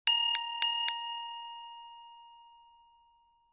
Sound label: BELL DINNER